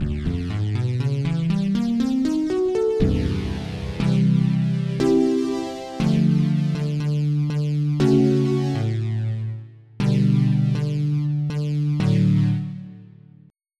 Synthy Stick

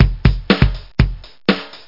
BEAT

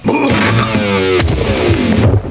falling

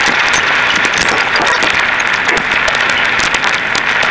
TV NOISE

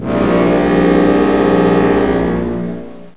horn; Duke Nukem 3D Kill-A-Ton Collection

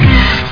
RAP HORN

